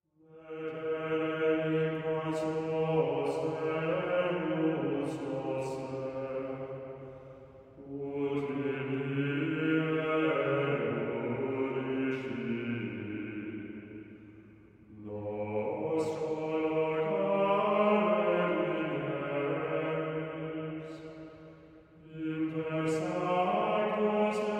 antiphon, canticle; compline for passiontide vi.

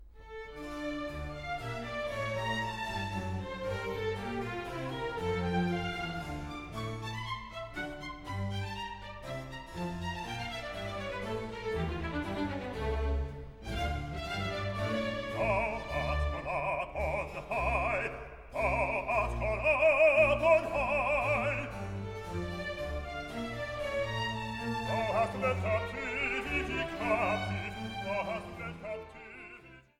bass; part 2, no 36, thou art gone up on high

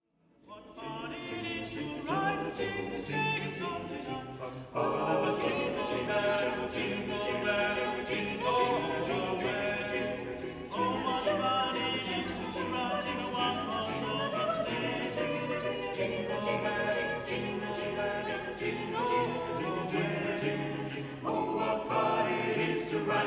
jingle bells

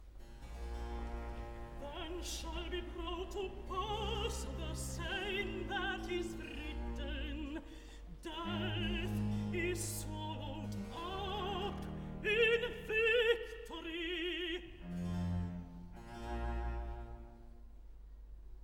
alto; part 3, no 49, then shall be brought to pass

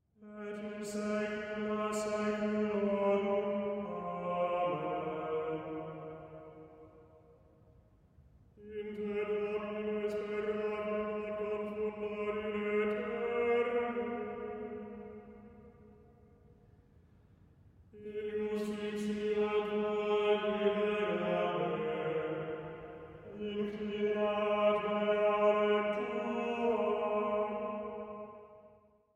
compline for passiontide ii. antiphon psalms

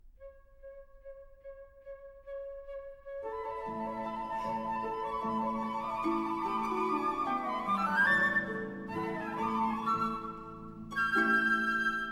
trio for two flutes and harp; part iii, l'arrivée à saïs scene 2

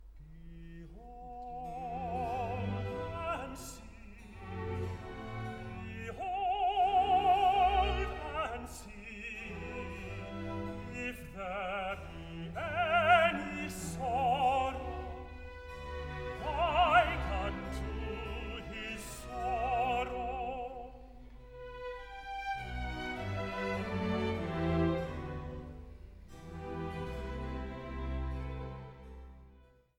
tenor; part 2, no 30, behold, and see if there be any sorrow

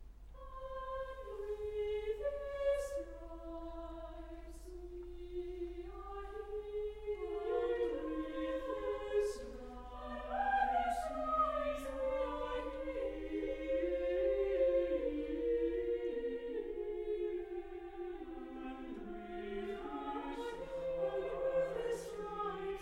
and with his stripes we are healed, chorus

